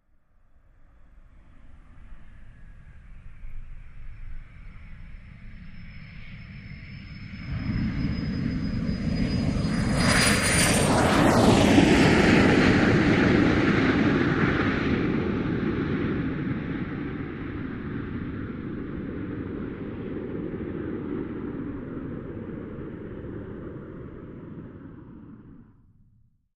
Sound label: Lear Jet By Land Mediu, jet landing